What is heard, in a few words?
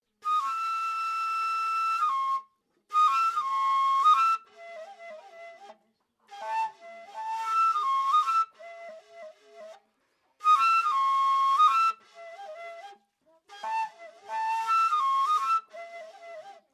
Lisu harmonic flute